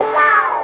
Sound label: Ohwahhhh Guitar